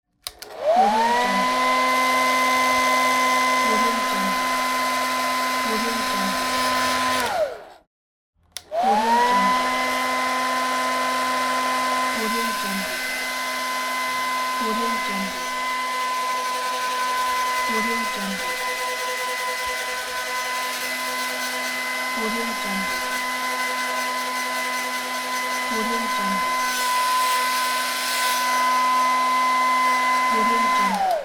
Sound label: hair dryer